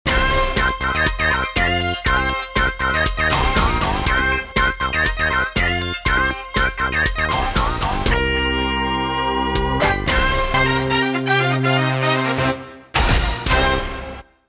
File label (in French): Emission jingle; Jingles medley